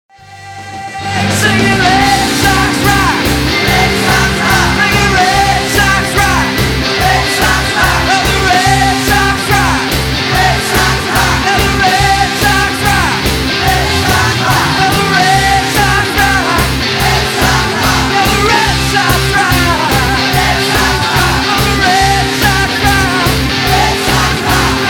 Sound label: ringtone